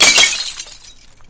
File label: explode extinguished, molotov; weapons